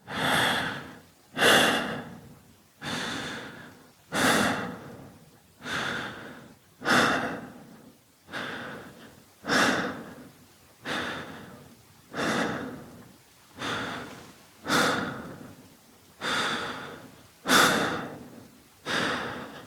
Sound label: Breathe Walk Loop; Warframe; Entrati Lab Quest; 2023 TennoCon; Arthur